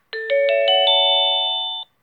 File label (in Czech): melodie